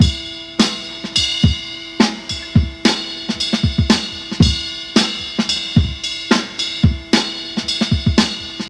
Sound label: 110 bpm drum loop sample e key